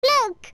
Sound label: Look; Navi; Zelda; OOT